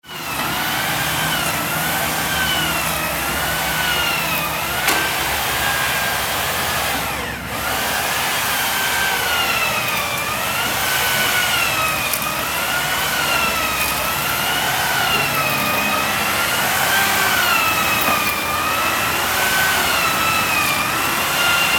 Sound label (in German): Bohrmaschine